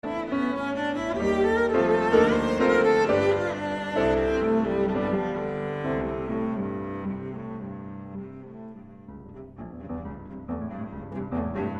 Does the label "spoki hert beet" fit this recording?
No